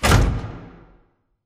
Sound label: sliding door sm verb open stop; beacon; Titanfall 2